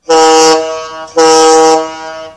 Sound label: two short blasts